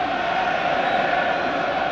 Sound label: CHANT